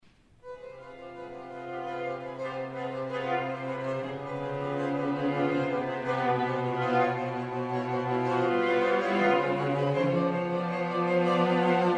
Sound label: string quartet